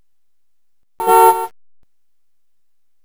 car

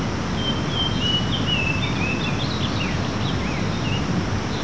bird; lmctf

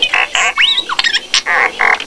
DROWN; r2d2

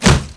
melee

